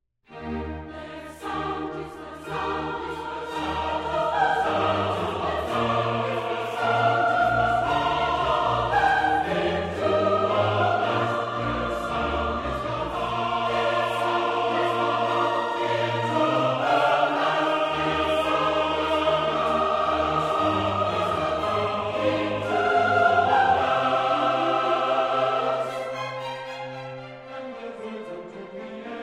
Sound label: Toronto Symphony Orchestra; Messiah - Handel